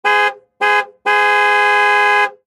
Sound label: three police car horn blasts; sound ideas